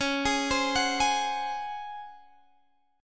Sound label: C sharp Major 7th Suspended 4th, Guitar, Half Step Down